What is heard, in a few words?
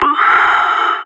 death; Counter Strike; CS:GO; separatist